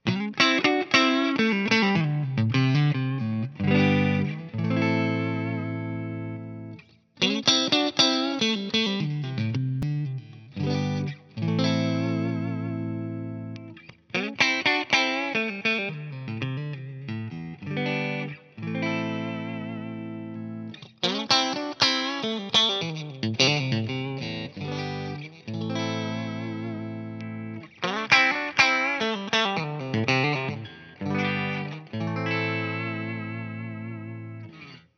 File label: 69 Strat Set Clean; StratDemos